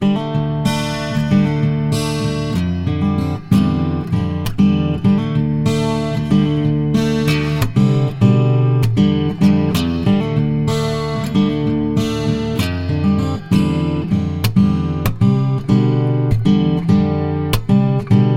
Yamaha Transacoustic LS-TA Fingers Chorus Reverb, guitarra acustica